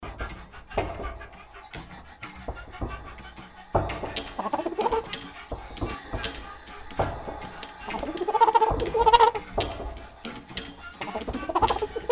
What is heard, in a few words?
Trumpet and Electronics; Eclipse